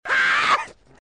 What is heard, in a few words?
HAH; reverse